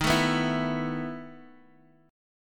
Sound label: B 11th Eb, chord